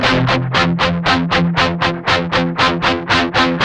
8TH F#3, Guitars; Spectrasonics; Hans Zimmer Guitars VOL-1; 128-JACKHAMR

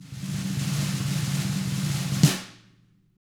TAMA BR RL, Drums; ILIO; Double Platinum Drums 1

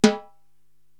Timbale Hi Edge, Korg TR-Rack

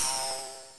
Ball Flies In; SNES; Bill Laimbeer's Combat Basketball

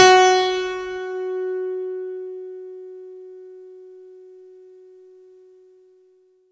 F#3, synth; 90 s Sample CDs; NiceBeats; SynthLine VOL-26

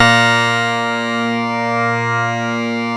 A#, PIANO; Big Fish Audio; Synclav FM

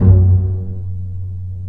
Bass Piz D F#1; Digital Sound Factory Virtuoso

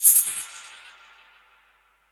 Perc Hit, dub percussion

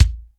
DRY K, drum; Crypton; DrumBase5000

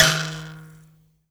MBIRA D#3; BS MBIRA1; Heart of Africa

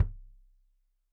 Kik Stereo; Deeply Sampled Drums

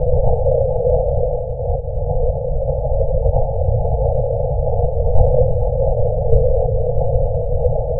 Drone Ambient; Drums & Drones

